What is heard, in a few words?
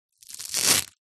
household hairbrush hair rip pull from bristles